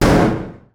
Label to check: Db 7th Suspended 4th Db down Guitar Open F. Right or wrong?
Wrong